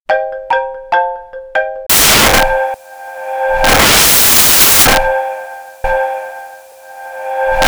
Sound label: XYLOPHONE; I LOVE YOU